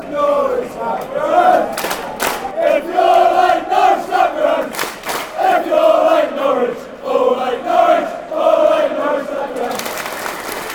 if you all hate norwich clap yer hands, fan chants